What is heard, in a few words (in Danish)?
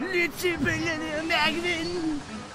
B lger og vind